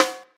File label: low tone snare drum c key